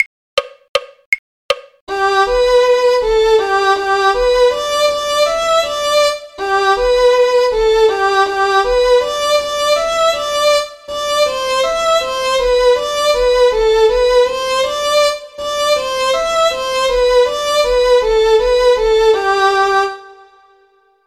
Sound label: violin; carols